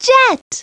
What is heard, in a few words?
jet; Treasure Galaxy; Learning Company